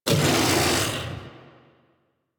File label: Kubrow Armored Vocal Attack Medium, Enemies; Warframe